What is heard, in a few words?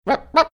making pair funny sounds